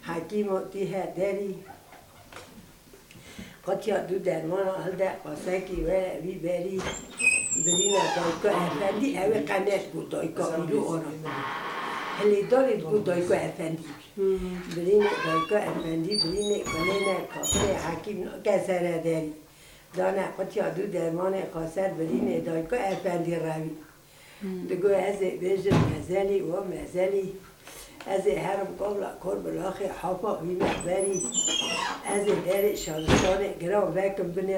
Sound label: non Melodized Lament